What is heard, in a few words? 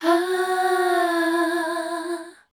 MELODIC #F, Voice